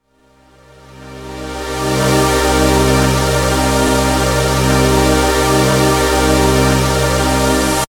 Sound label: juno pad swell